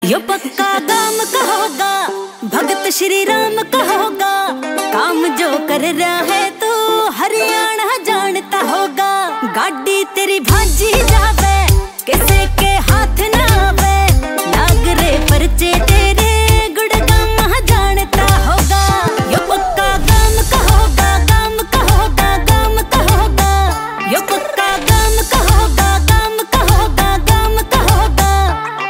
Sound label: Haryanvi, ringtone